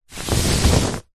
household bedsheet movement fast single rub hand flatten